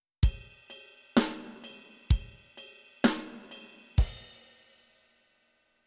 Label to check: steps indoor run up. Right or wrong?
Wrong